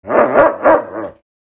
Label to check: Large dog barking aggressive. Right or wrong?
Right